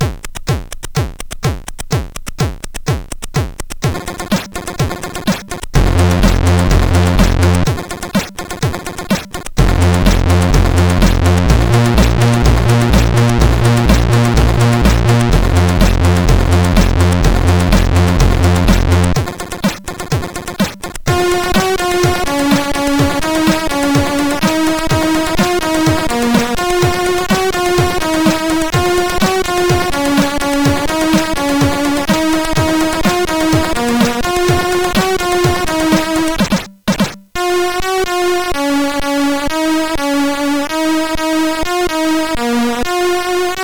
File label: tune sid CSG8580R5